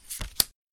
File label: deal cards